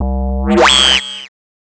Synth Hits, C3; Zero-G; Soundclash Nu Dance Electro; Acidized

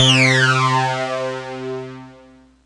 SPACELAB, MEMORYMOOG, OLD SYNTHS; Rubber Chicken Software Sample Bank

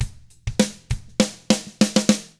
ROCK, Drums; ILIO; Double Platinum Drums 1

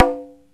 CONGA SET; OMI - Universe of Sounds

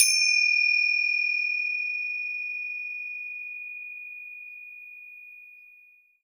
FINGER CY R, Synclavier Percussion; ILIO; Synclavier Percussion 2; World & Orchestral

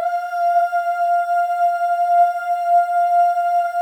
OO, FEM OOS, Voices; Symphony of Voices; Spectrasonics; FEM.2 OOS

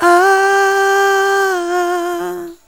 SUNG, VOCAL

